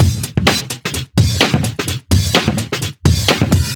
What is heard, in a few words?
House Beat, 128 BPM